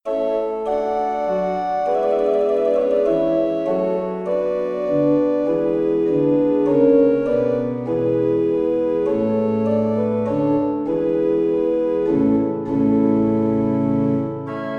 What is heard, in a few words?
Organ Minuet in A Major; Organ Miniature; Georg Philipp Telemann